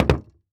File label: Foley Footstep Golf Cleats Jump On Hollow Wood Surface Quick; Foley Footsteps